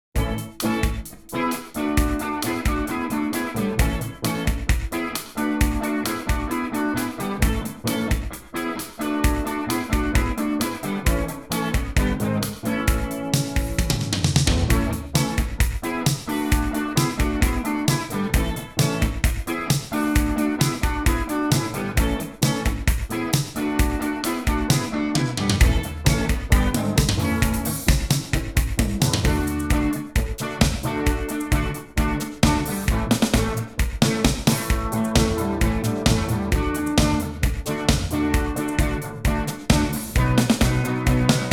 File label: Gitar Rag 132 bpm